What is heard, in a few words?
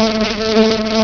FLY FLY